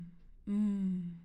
Mmm Mujer

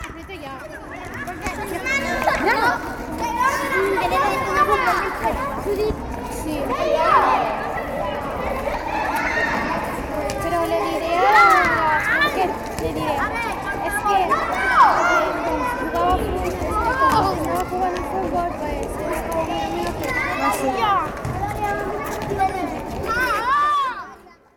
Patio

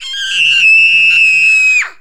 Grito

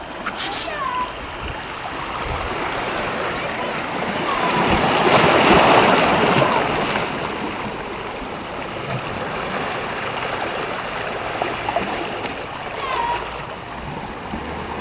Sobre Agua Olas

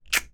Beso

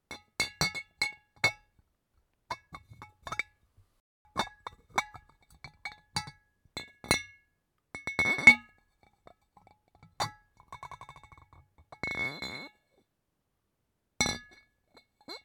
Bote De Cristal Y Tapa

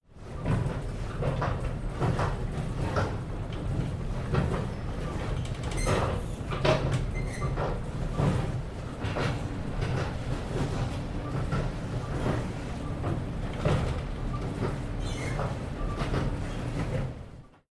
Escaleras Mecanicas Interior Metro; subway

